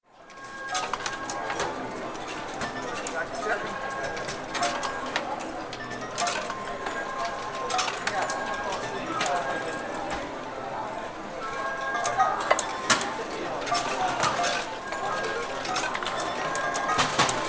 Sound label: casino